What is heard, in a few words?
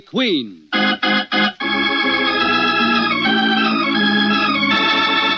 organ hit; MELODIC SAMPLES; KEYS ORGAN PIANO